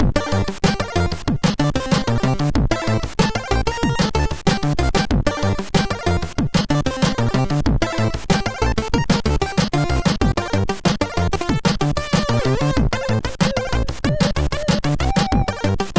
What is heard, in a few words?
Su-Sweet, MOS6581R2; sid